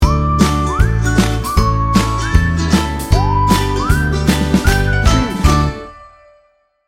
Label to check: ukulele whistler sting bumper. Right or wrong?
Right